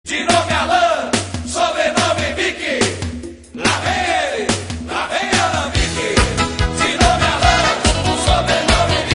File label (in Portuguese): musica